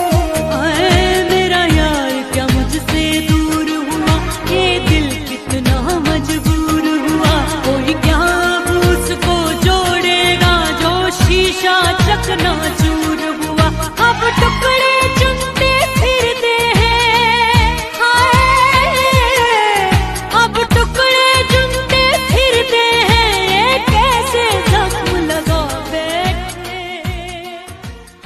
beautiful bollywood ringtone

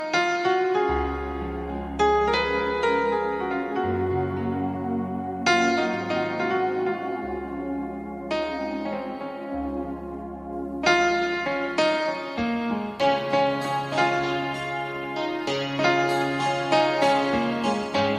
hindi instrumental ringtone bollywood ringtone

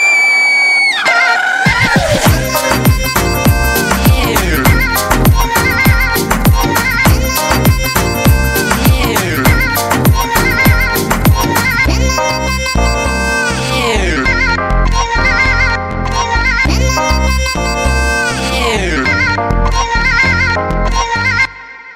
punjabi music ringtone

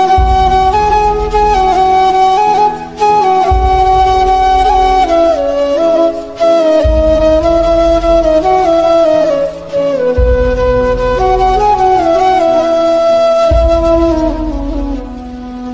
new hindi sad music ringtone, bollywood; bollywood ringtones

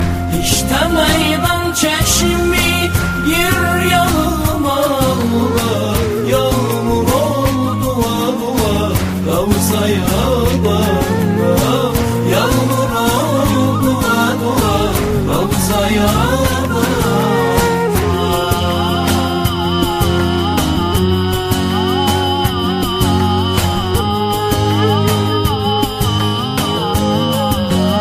turkish ringtone, islamic

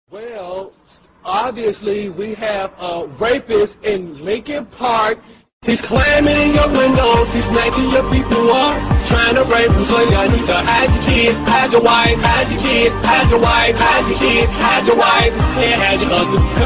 rap song ringtone

